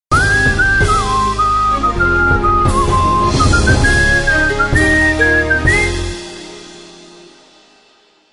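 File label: whistle; geo tv; svist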